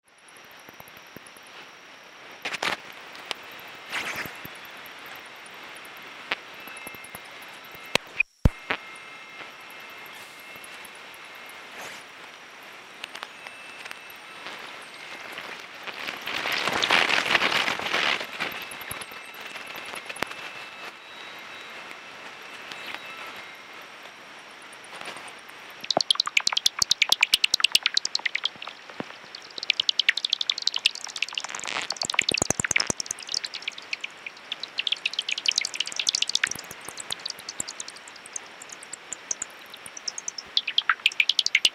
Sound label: Bat Calls; フィールド・レコーディング音源; 柳沢英輔『フィールド・レコーディング入門 響きのなかで世界と出会う』で紹介されている著者によるフィールド・レコーディング音源です。